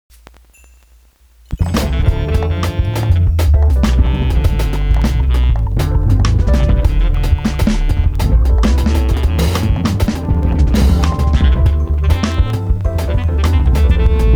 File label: jazz